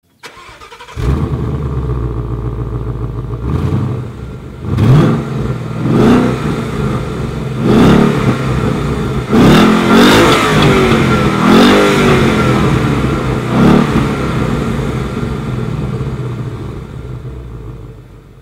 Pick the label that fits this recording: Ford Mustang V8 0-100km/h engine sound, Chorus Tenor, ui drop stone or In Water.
Ford Mustang V8 0-100km/h engine sound